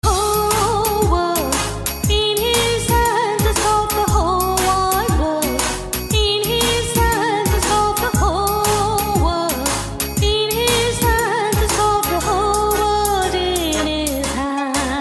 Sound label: Religious Tone